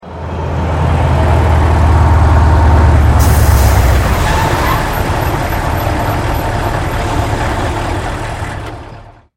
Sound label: Enemy Artillery Arrival; Godzilla Smash3